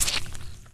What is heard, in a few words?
squeeze splat